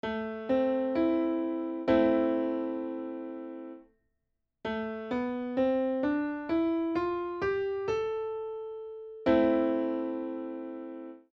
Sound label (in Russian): eolijskij zvuk; Соответствует натуральному минору.; Звучание: тёмное, печальное, задумчивое.; Пример: ля–си–до–ре–ми–фа–соль–ля.